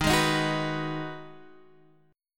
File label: Ab Minor 7th